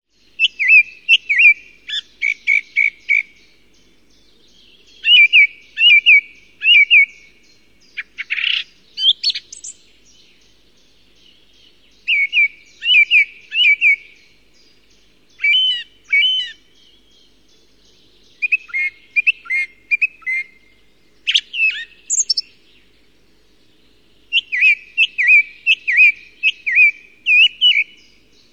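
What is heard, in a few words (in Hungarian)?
Énekes rigó; Madarak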